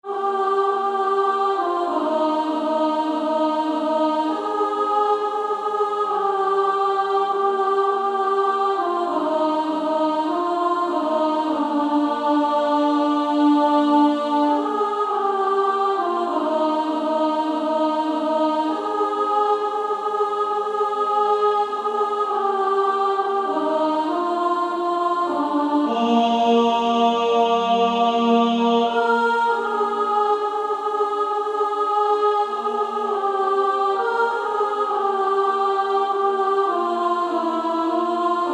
Female Choir; 2nd Soprano

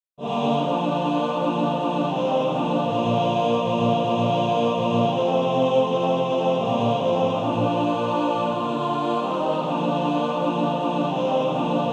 Male Choir

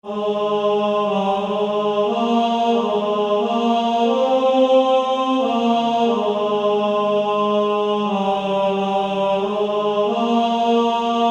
Male Choir

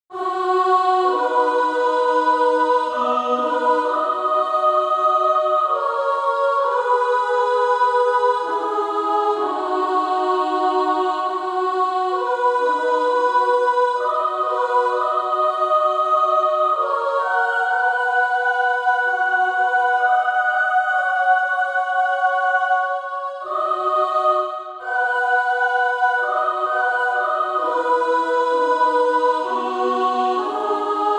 Female Choir SA

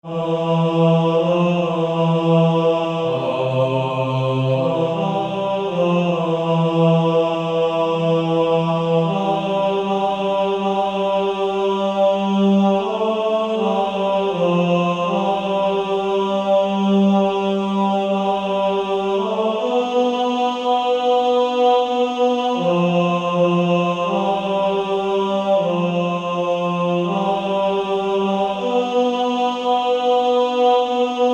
Male Choir 2B; Rock of Ages

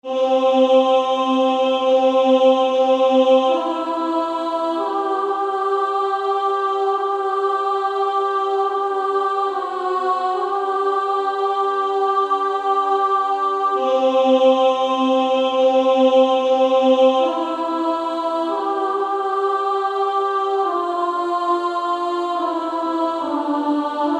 Female Choir; More Love to Thee

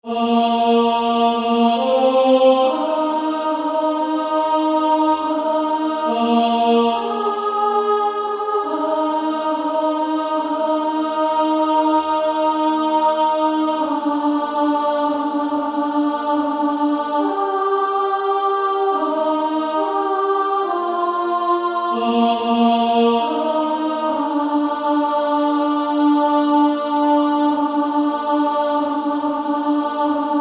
Female Choir; Lead Me Gently Home Father

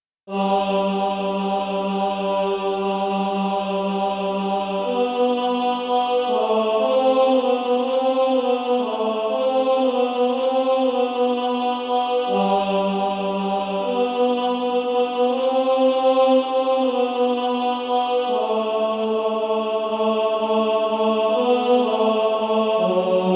Female Choir

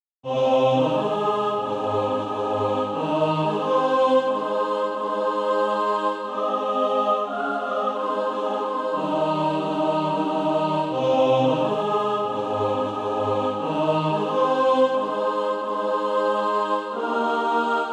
mixed; A Shout in the Camp

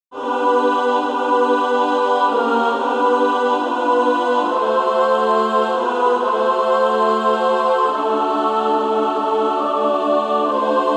Female Choir SSAA

